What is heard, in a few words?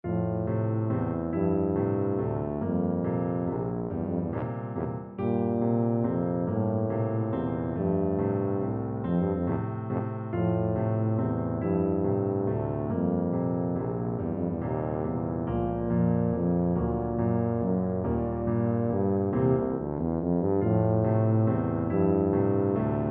tchaikovsky flower waltz, tuba